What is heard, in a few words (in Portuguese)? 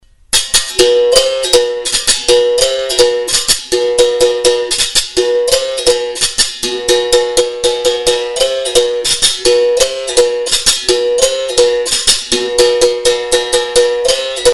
cavalaria, capoeira